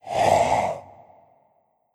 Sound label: Scream; Sarco Horus Eye Scream3